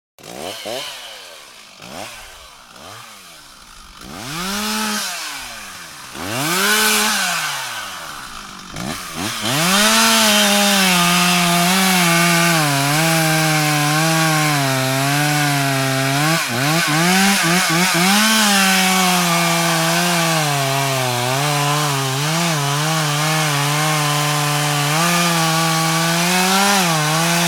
Chainsaw cutting down a tree; Power Tools

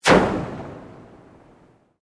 Sweep Organic Fast

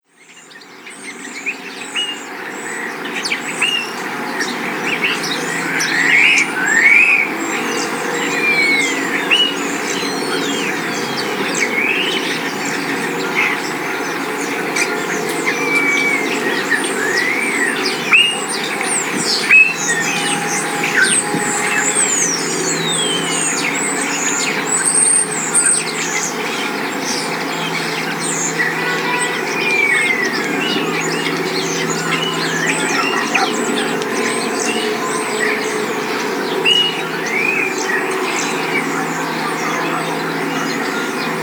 Bird Song In Spring Coastal Town Ambience; Outside Ambience Sound Effect

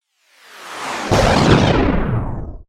Static Descend, Static, Burst, Stutter; Production Element, Imaging Element, Accent, Transition; BlastWave

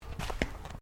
FOOTSTEPS THONGS (FLIPFLOPS) CARPET STEP; Footstep Foley; Human

